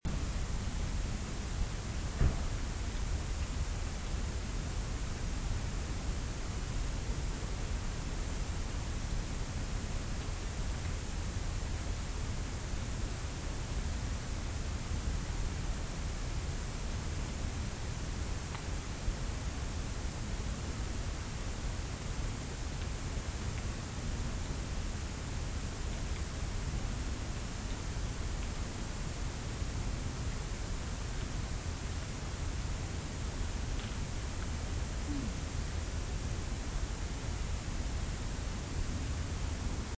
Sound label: Knock Hoot